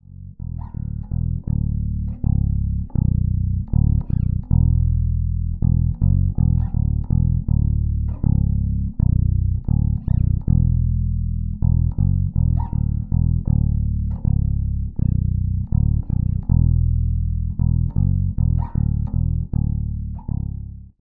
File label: Sadowksy NYC Modern Low B passiv